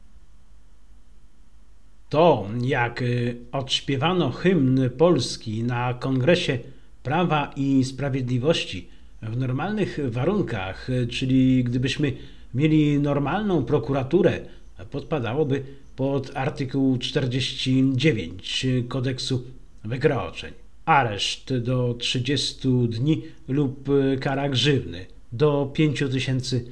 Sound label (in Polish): Hymn